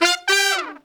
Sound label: BRAZ LICK, BRASS LICKS